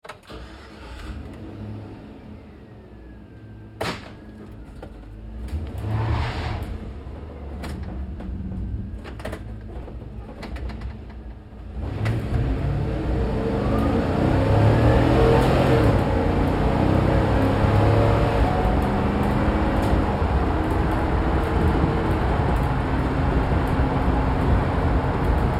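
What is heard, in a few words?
Truck GMC Savana Interior Drive Accel Decel Fast; 2010 GMC Savana; Trucks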